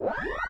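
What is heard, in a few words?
plasma rifle power up; allegiance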